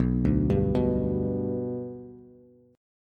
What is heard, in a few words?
B Minor Sharp 5th, Bass; arpeggiated; JGuitar